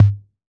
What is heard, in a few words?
606 Tom